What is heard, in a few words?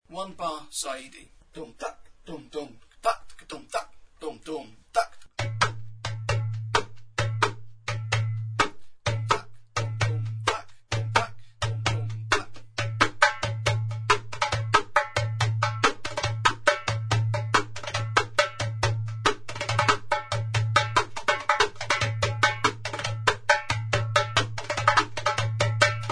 saidi